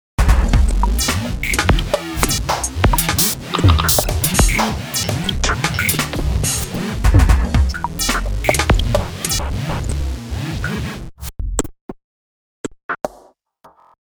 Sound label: reaktor Osc Polyphonic Drums; Ruby polyphonic drums example